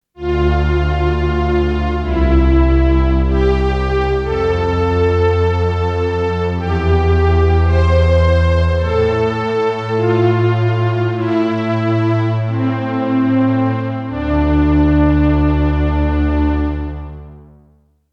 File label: MKS80 Dual Pad